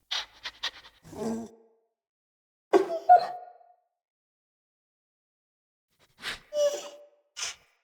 Wolf Cub UI Menu Idle; Vocals; Animals

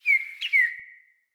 Cardinal Female Bird Song

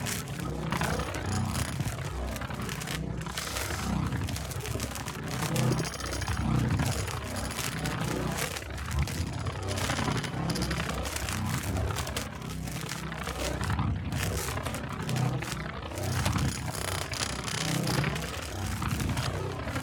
Overcharged Loop; Warframe; Gyre

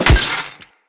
Melee Staff Impact Robotic Flesh

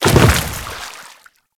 Grineer Step Water, Footsteps; Enemies; Warframe

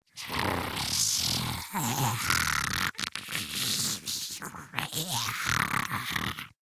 Breathing Grineer Ghoul; Grineer Ghoul Expired; Battle Barks

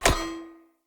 Cleaver Throw Catch, Swords; Weapons; Player; Bromius Cleaver

